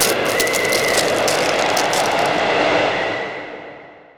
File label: ice shards projectile; tusk